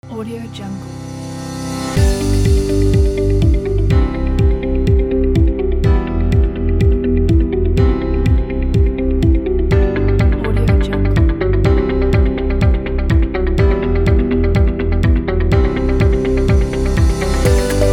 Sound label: promotional